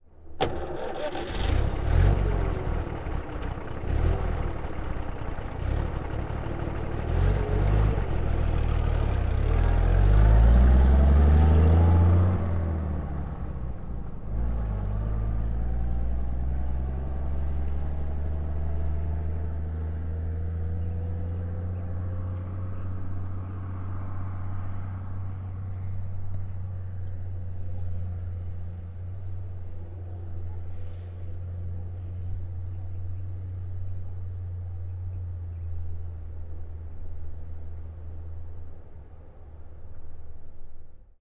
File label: Sedan Ext Start & Away, Period Vehicles